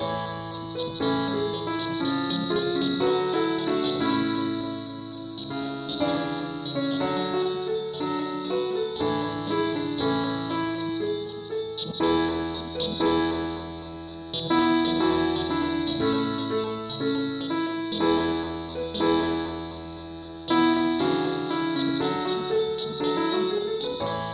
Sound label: keyboard only key a